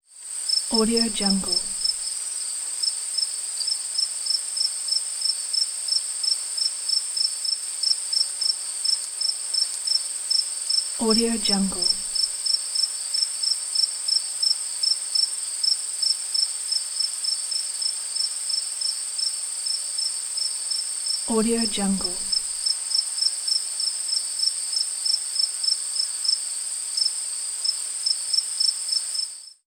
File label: crickets